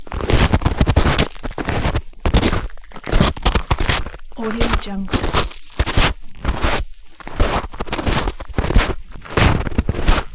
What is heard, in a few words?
walking on snow loop; debsound